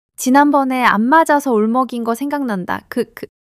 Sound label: laughter